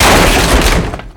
Barrel; Wild West Shootout (Arcade) (gamerip) (2019)

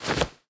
Browse Skin; Subway Surfers City Sound Effects; Android

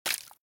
Tear impacts; The Binding of Isaac

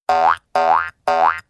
Zombie Walk; Wild West Shootout (Arcade) (gamerip) (2019)

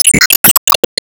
Enemy Weakpoint Hit

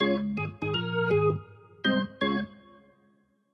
Organ; Fourth of July 2019

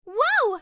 Falling

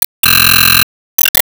Se Team Skill Good

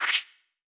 Dash

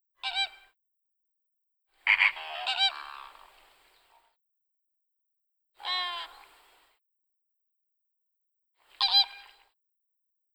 Atacama Flamingos

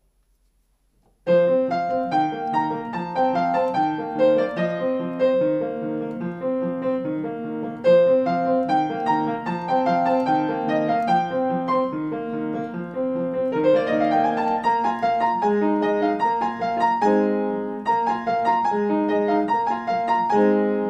Piano; Airship

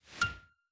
Particles Impact; Event Progress

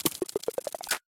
Charge Attack Slow; Going Under

